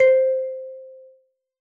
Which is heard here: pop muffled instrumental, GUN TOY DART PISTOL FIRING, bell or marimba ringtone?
bell